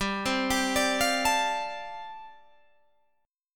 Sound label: G 7th Suspended 2nd Suspended 4th, Guitar Open G